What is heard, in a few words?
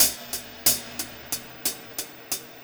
Cymbol Particle; Particles